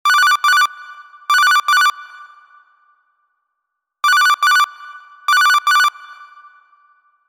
Codec Ring 2x

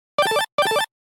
Exec Blast, Business Rings

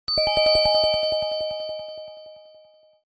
Echo Bells, Business Rings

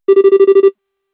Office Pulse Lo

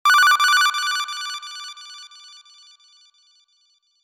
Codec Ring Echo

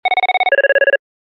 System Down, Business Rings

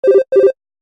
Office Ring 2x Fast

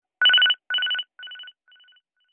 Ring Fader Hi

